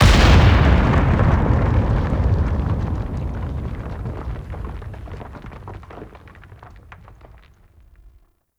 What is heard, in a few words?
Barrel Explode Rock Avalanche; Warframe; Ambience; Grineer Forest; Forest Vay Hek Boss Room